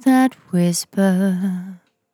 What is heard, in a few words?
On A Star 65bpm F, Vocal; Zero-G